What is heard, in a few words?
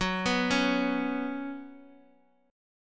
Gb Suspended 4th Gb, down; arpeggiated; JGuitar